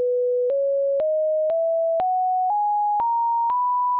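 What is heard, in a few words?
mean B do re mi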